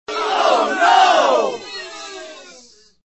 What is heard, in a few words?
fail; MaseratiGranturismo; Racing Games